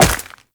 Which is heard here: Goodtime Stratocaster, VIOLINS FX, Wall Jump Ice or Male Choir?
Wall Jump Ice